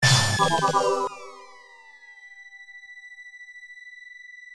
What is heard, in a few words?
Peaceful (Coin); arcade; Growl